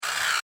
Servo Mechanical SciFi Robot Toy, Scifi Servo Mechanical Robot Small Motor Toy